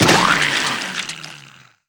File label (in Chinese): ruchong; monster